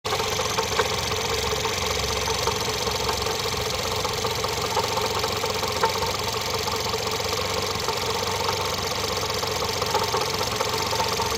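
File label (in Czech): Motor, Klepání motoru po výměne oleje (Fabia II 1.2 TSI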